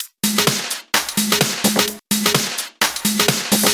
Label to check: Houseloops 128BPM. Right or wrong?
Right